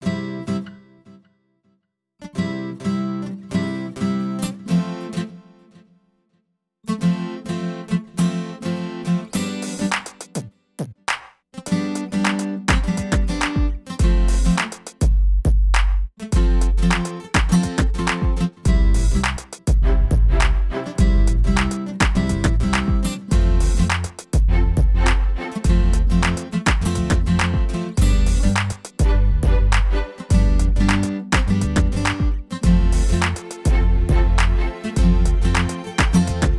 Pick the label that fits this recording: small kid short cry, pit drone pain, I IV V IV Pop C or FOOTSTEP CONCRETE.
I IV V IV Pop C